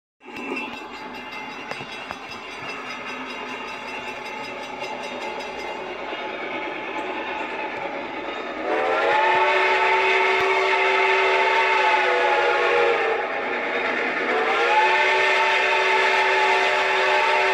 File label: Steam locomotive